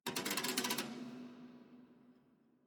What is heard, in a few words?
ambience room torture cage